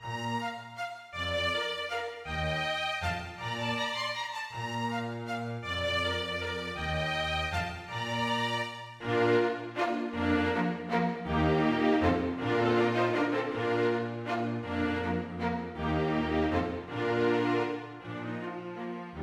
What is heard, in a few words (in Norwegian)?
Menuet for strykekvintett; Aamodt